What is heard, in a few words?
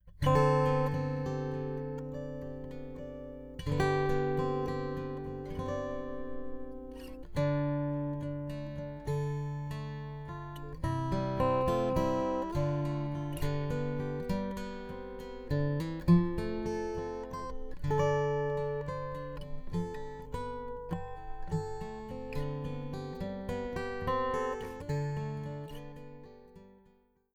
Piezo eq; フィンガーピッキング; ピエゾ; ここではいわゆる"フィンガーピッキング"ということで"Scarborough Fair"を弾いていただきました。; ピエゾEQ; Santastic